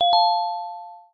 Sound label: notification